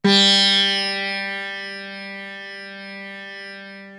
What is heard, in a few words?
SITAR